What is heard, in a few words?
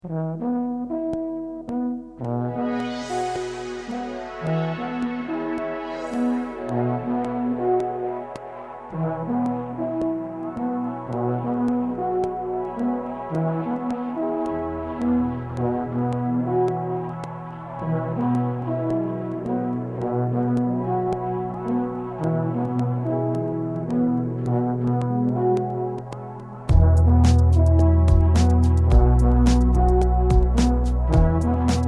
funky alpione horn